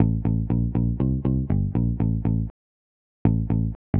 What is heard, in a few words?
U Bass, Vintage Lo Fi Samples